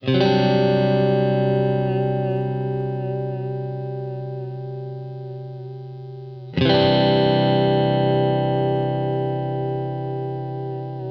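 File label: Country Guitar Breakdown; Digidesign; Pro Tools Essential Loops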